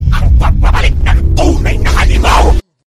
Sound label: Msg Tone